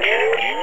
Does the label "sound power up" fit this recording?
Yes